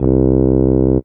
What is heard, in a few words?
Casio CTK-611 Tuba C2